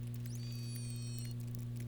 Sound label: Globicephala melas